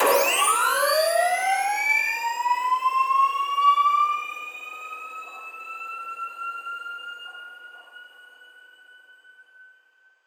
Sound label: Flash Sound Powerup